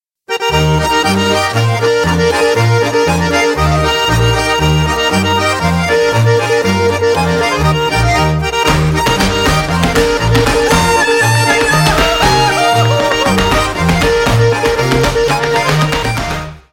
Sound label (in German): Schottisch